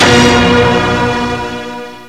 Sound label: NOISE HITS; Kid Nepro - Elite